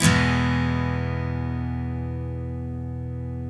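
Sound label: steel E major up, CHORDS STEEL, Guitars; Spectrasonics; Hans Zimmer Guitars VOL-1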